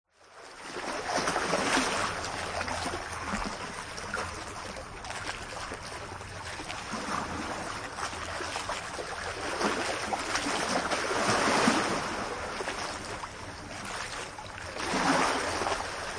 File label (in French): Vagues sur les rochers Binaural